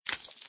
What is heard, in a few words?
getprize; wanNingTaiQiu